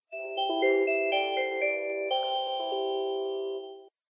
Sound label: boot audio; DNS S5003